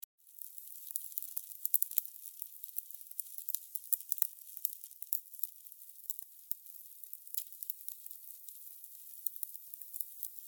Candle burning, crackling; fire

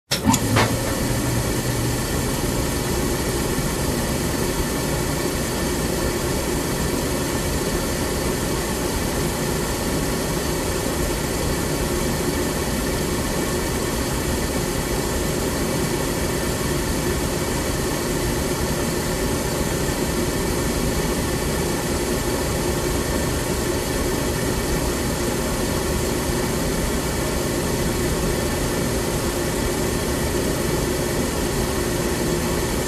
Fire in the oven, open, close the door

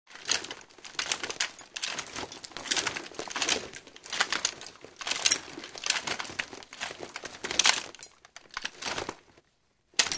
Running, moving with weapons; steps

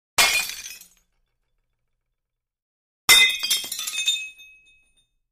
glass breaking

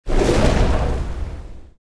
Submarine torpedo launch

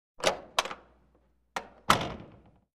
Metal door open, close

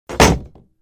Sword strike; cold weapons

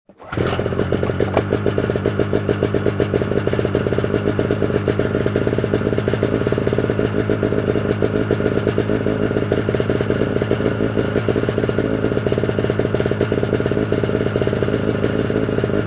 Snowmobile starts, waits, turns off

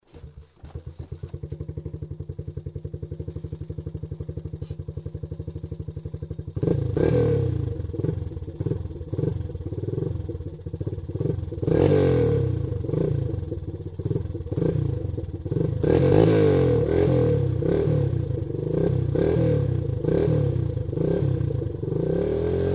Tricycle starts, regassing, turns off; motorcycle

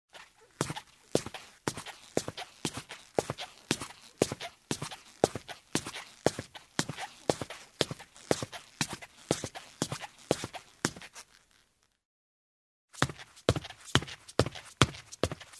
Steps on a wooden ladder in shoes

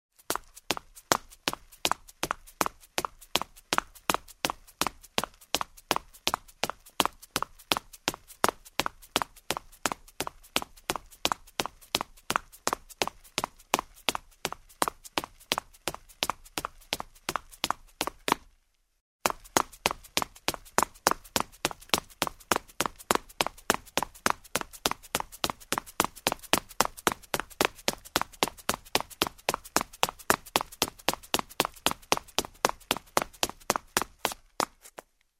Running on the marble floor in shoes; steps

